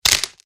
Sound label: bone Break; halloween